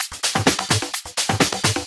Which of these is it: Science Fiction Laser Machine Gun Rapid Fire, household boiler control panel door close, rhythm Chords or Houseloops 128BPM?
Houseloops 128BPM